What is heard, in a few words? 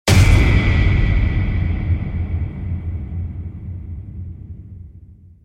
Scream Horror Hit; Скример удар; Звуки удара; Звуки удара для Форсакен; Eyes Scream Horror Hit